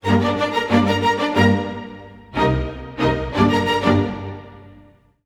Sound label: 90 g min strings, Orchestral arrangements; PowerFX - Downtown Orchestra